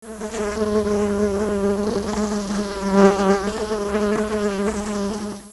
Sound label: FLY